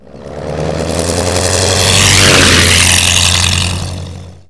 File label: henschel takeoff; WAR